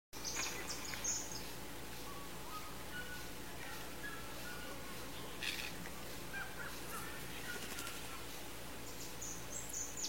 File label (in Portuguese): Canto da boucards wren